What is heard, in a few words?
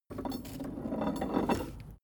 metal tools rolling; berufe; handwerker; soundpool